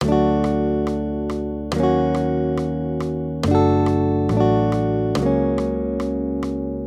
Accompaniment, Major Triads, Root Movement Four Semitones, D-Gb 140